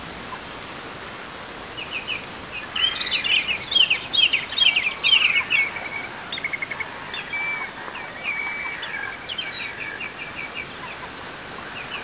MAIN LOOP, Circus; Grolier Demos

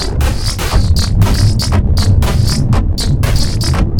Hoppin Lasers; Sonic Foundry; saturn

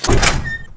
door metal open; Spearhead Demo

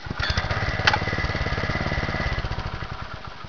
Golf Default Sound; Microsoft

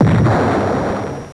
spell Bomb; CHECKERMANIA 1.04

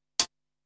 hihat

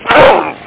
tackle; amiga cd sports football 2

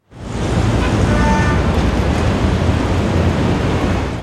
traffic; SGI IndiZone 2; earth

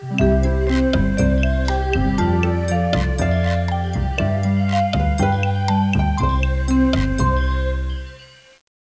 Theme; Education Publishing Concepts

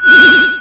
Teleport

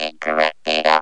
incorect; Digital Sound Gallery - Volume 1

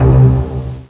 pizzicato C2; Star Trek JR Audio

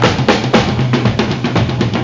breakbeat; World of Sound; instruments; beats

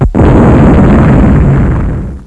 Torp Explosion; Covert Action; Strategy

